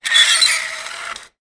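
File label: sounds of scratches on metal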